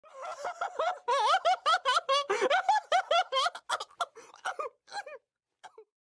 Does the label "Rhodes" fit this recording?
No